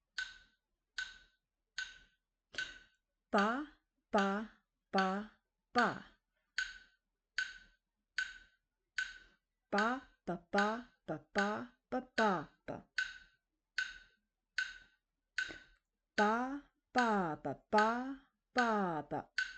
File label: Duple swung eighths